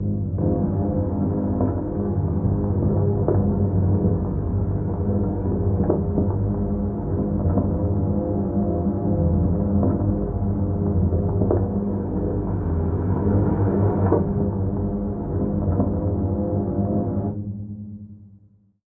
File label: Menacing G#2; Zero-G - Ghost In The Machine